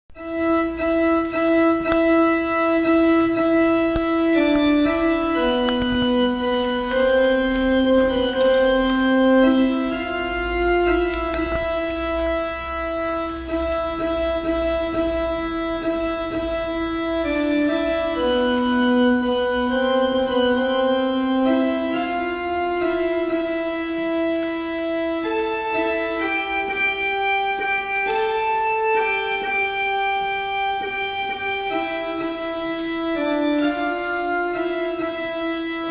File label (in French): Wer nour alto